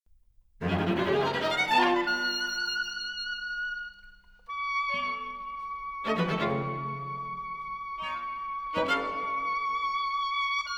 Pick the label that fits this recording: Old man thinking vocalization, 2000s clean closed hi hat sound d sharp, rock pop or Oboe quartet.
Oboe quartet